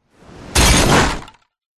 impact designed light whoosh into various junk objects crashing